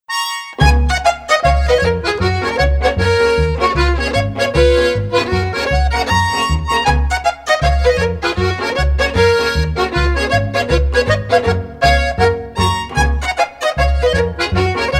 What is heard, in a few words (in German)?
Polka; Im Wirtshaus